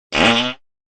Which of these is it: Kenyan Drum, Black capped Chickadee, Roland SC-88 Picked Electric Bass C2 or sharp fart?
sharp fart